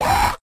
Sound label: Goblin; Goblin Old